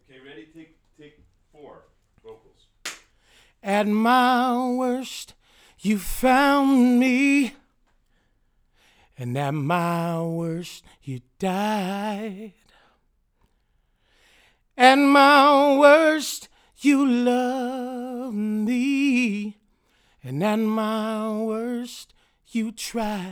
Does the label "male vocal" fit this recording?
Yes